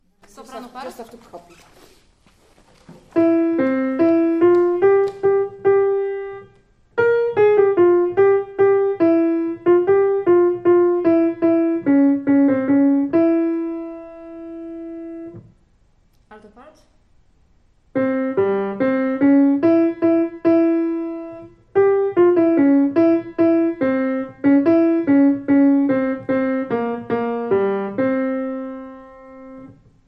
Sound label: piano; Wos di Wilst piano soprano + alt; Oefenen; Jiddish ensemble TOCH